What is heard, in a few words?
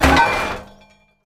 objects, World of Tanks